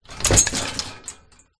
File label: household mirror floor standing shake wobble